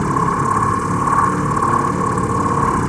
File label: body loop a, strider, creatures; Half Life Alyx